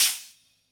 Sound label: Aircrafts Su-27 Cockpit Canopy Stop Cockpit; DCS